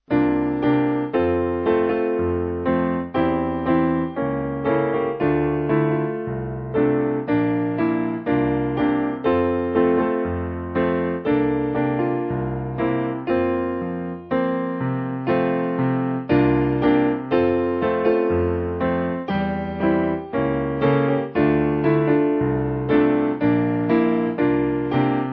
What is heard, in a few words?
Piano